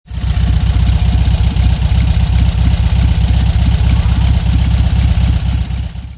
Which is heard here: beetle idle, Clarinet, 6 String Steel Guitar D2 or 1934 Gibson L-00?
beetle idle